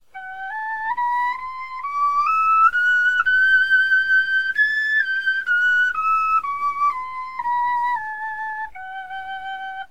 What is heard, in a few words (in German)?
tonleiter